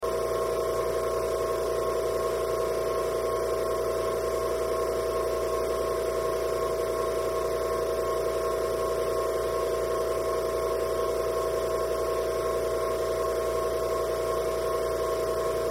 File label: Air Compressor Run Constant Loop Motor; Engine Machine; Air Pumps; INDUSTRY